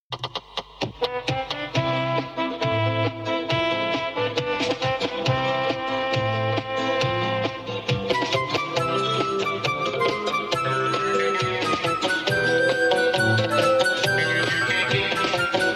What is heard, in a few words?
instrumental; Музыка к мультфильму "Сквознячок"